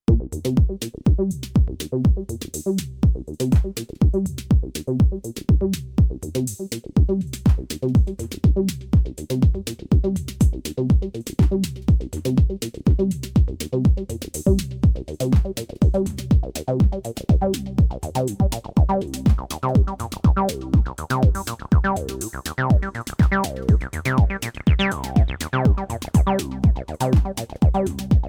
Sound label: Roland T-8